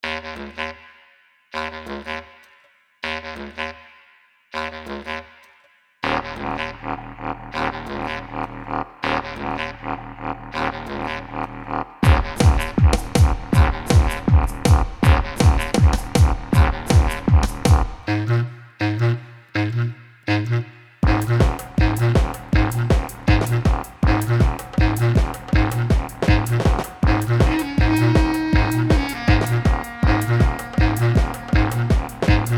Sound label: drum n breath